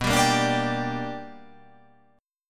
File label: B Minor Major 7th Sharp 5th, chord